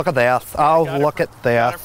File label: look at this laugh